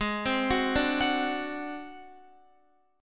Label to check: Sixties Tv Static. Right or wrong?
Wrong